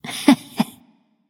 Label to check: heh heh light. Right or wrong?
Right